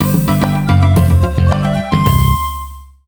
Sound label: Mine Cart Cave - Clear; Mini Mario & Friends: amiibo Challenge